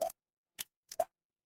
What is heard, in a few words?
water drip